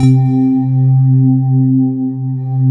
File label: celtic C3; Best Service Dream Experience